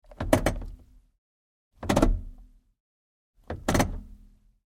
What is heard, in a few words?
Truck GMC Savana Gearshifts; Vehicles